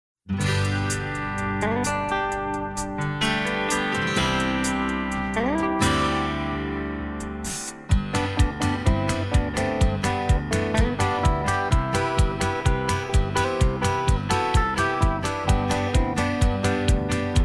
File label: harmony vocal, square dance music